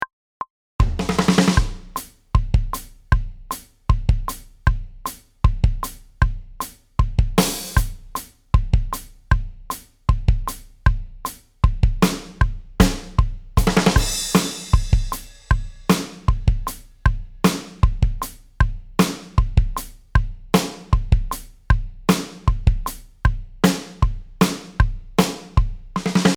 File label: Drum